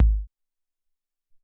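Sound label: Tek Kick, Alesis SR-18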